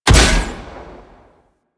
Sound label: Burst Explosion, Weapons; Warframe; Tenno; Miter